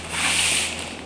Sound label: battle; batword